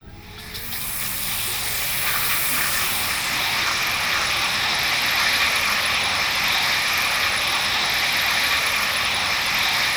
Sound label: ATMOPAD; Best Service; XX Large; Pads